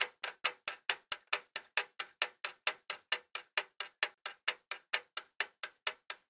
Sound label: oven timer